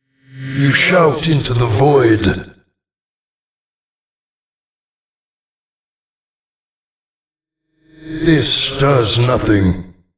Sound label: shout